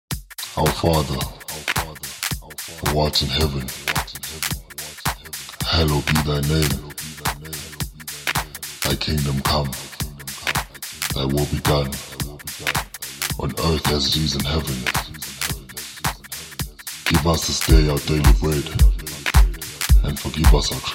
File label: Exotic Deep Soulful